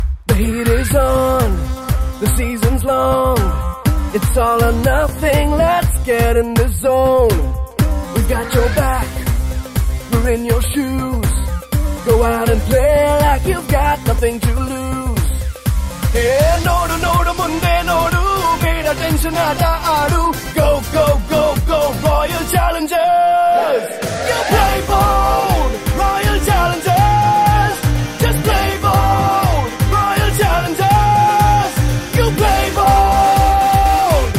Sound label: Ringtone; RCB Anthem 2018